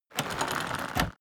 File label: crossbow load